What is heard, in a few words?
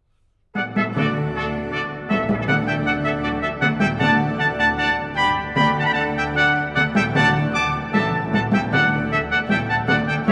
trumpet fanfare; baroque; Mouret: Rondeau